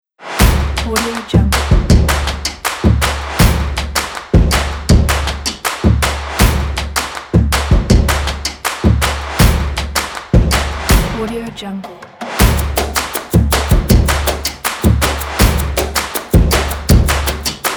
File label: action drums cinematic percussion